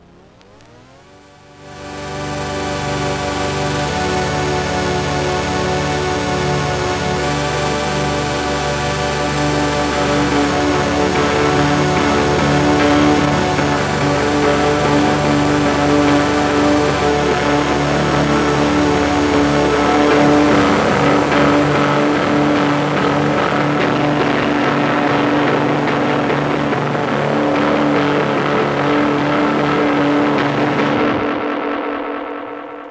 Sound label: organs & pianos